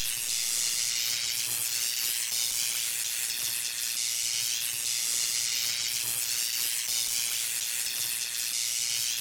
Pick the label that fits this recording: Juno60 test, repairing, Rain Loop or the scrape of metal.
repairing